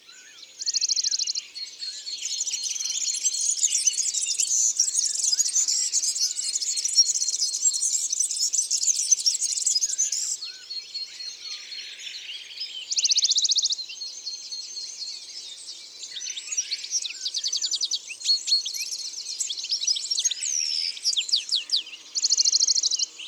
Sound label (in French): Pouillot de Bonelli, Isere; Concerts naturels de l'Isere; Studio Les Trois Becs